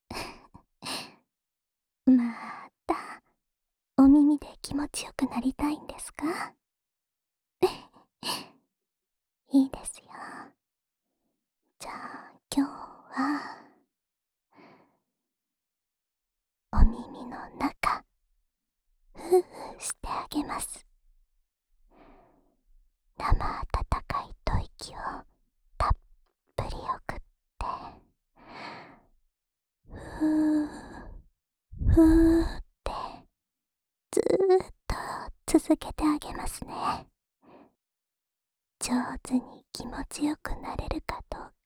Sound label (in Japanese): 安眠用お耳ふーふー（右耳）, ASMR